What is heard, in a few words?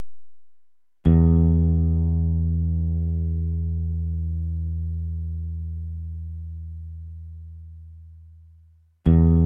G Chord